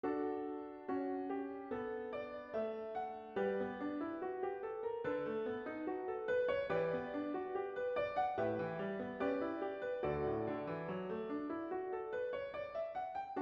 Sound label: Piano